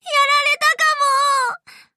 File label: section fail; Shige Seoul (16-9)